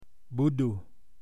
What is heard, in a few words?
Donkey